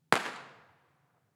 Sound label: IR petardo; Teatro Benevento